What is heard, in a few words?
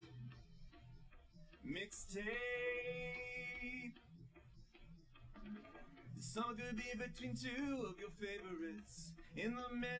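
with drums, live rec; SiSEC11; underdetermined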